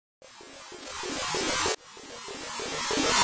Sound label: Teleport